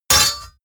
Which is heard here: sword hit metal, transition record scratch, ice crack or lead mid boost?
sword hit metal